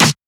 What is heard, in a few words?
Snare; FLPRO